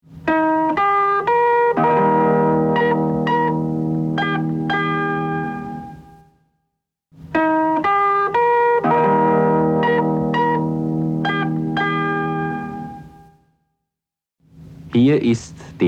Radio Austria Interval Signal

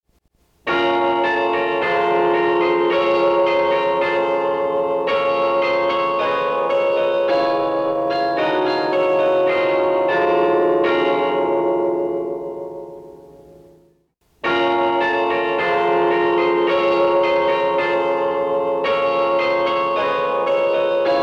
Radio Nederland Interval Signal

